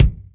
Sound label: Kicks Loud